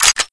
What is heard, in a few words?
aug bolt pull; weapons; 2aug